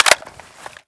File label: g36 mag out, weapons; czero opforce